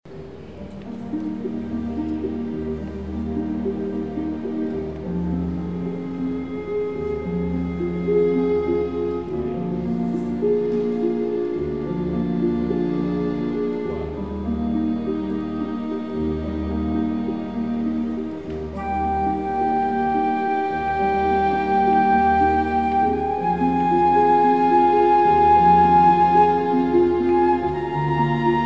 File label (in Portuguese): solo flauta, Piano e Cordas; Ave Maria Gounod